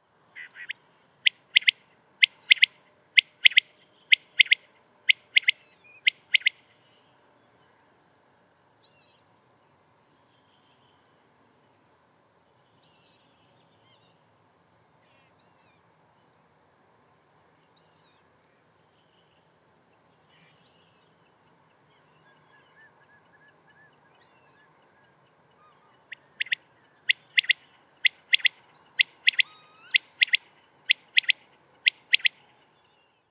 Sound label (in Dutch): Kwartel Coturnix coturnix